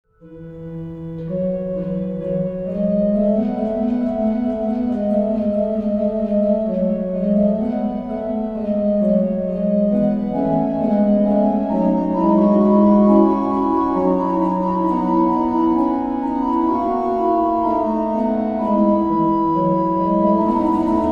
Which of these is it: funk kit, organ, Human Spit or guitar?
organ